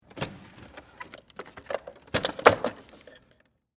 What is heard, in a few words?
Wood Ladder Close, Fold, Interior, Perspective; Yard Tools